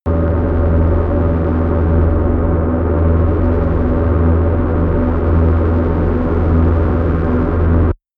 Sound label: spaceship flying; raumschiff